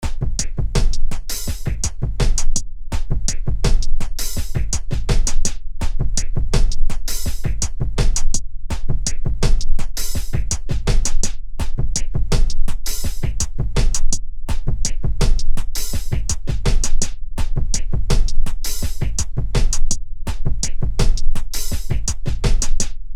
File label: drums; drums fo bums